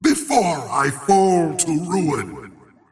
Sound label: teleport pain; razor